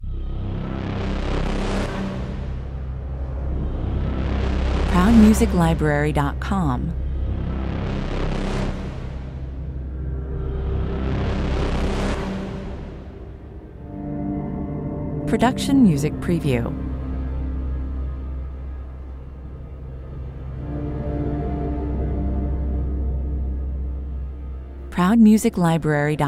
Menacing, dark atmosphere with slowly pulsating heartbeat, numerous orchestral FX; The creator of Greek mythology, Prometheus, lets greet